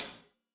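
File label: hat closed, hi-hat cymbals stereo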